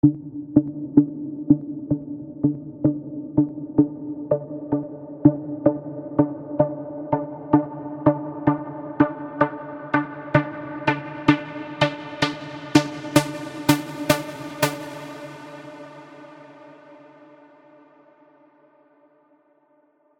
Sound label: massive; ni massive